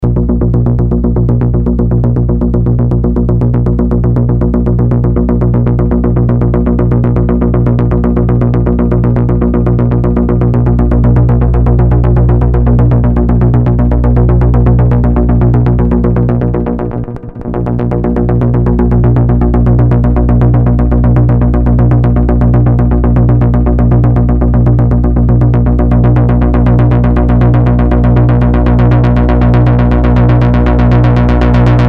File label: Deep Bass Seq